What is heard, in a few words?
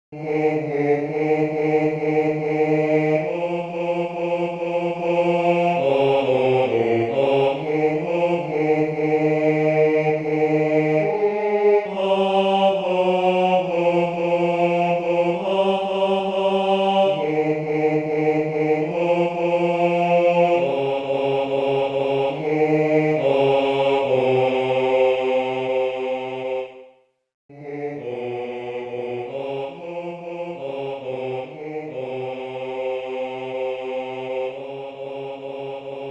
akogare baritone